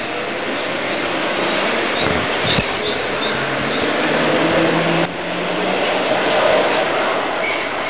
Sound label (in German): ubahn